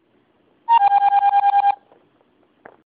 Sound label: Iphone Sms